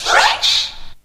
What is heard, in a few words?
Scratch Sample, DJ; DJ Breakthrough